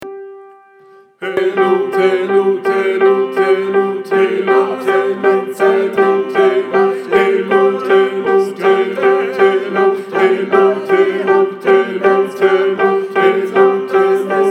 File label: TENOR; Gesang; Helut ihr zecher